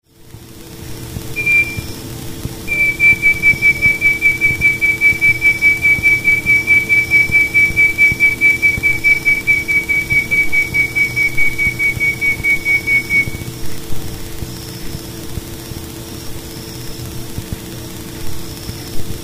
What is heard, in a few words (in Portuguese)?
canto do uirapuru veado